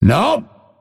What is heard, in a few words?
deny pain; dota; phantom assassin